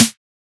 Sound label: Snare, A; Drum One Shots; Snares; Cymatics; Light Free Sample Pack; Bonus Samples